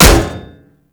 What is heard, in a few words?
Staff Impact Metal